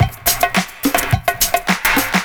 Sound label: PERCS, 70 s Breakbeats